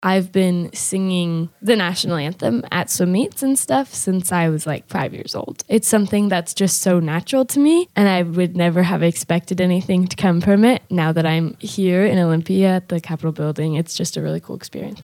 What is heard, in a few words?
NATIONAL ANTHEM